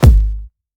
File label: Kick G; Syba; Syba sample pack